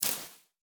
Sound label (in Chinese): 蜘蛛网破坏声